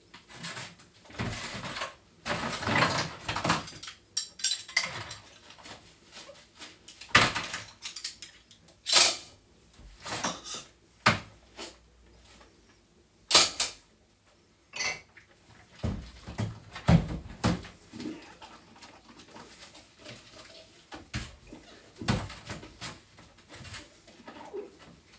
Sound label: Washing Up; Washing up the dishes.